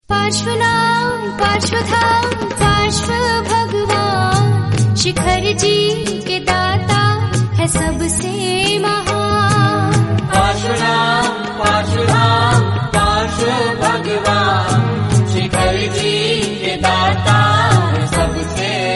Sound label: jain song; Religious